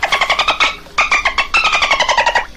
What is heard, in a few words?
dolphin